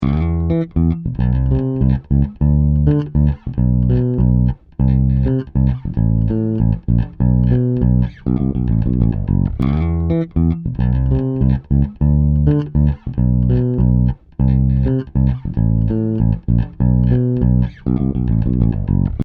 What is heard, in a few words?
fat tube; sansamp